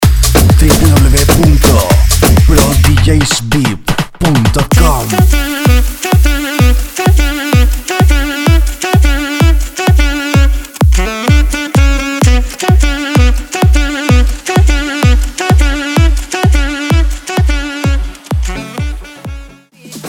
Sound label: SAXOBEAT